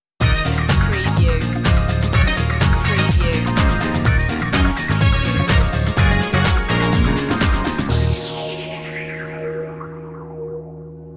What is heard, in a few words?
chic stinger